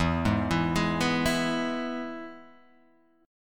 E Major; arpeggiated; JGuitar

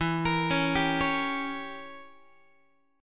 E Diminished 7th, Guitar; Edim7 arpeggiated; x,12,13,11,11,12; JGuitar

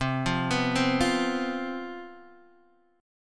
C Major 7th Suspended 4th C down Guitar Standard; arpeggiated; CM7sus4

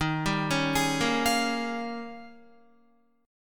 Eb 11th Eb, down, Guitar, 4F,4A,5C,5G,5C,6E; arpeggiated; JGuitar

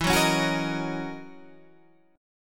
F sharp 13th E

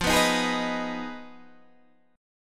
F Minor 7th Flat 5th F down Guitar DADGAD

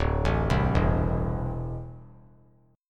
D 7th Suspended 2nd down, chord; D7sus2 arpeggiated

